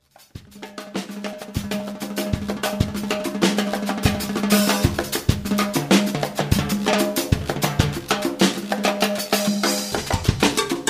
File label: Timba Quartet